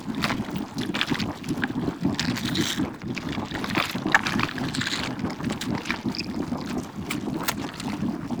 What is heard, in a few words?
Destructable Cyst Loop; Warframe; Infested Eris